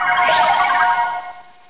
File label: water